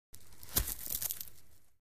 Biking Velcro Crack

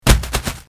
Impacts On Bubble Wra; bubble wrap

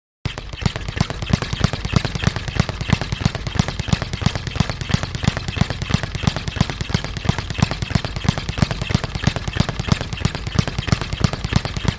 Boxing Hit Speed Bag, boxing

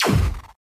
Hand Laser Fire, lasers

